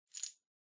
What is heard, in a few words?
Wall Outlet Cover Key Noise